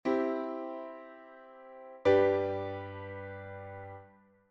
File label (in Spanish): Do M - Sol M